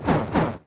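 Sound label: Vampire Bat Attack; Seer; GamigoNA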